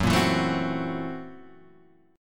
F sharp 7th Sharp 9th Flat 5th Guitar Half Step Down, chord